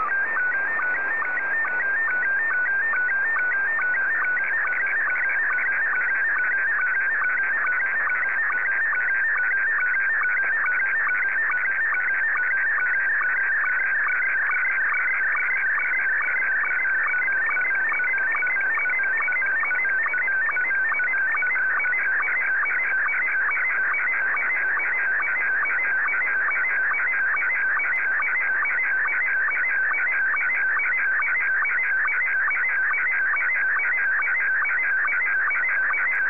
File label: sstv; VK8VTX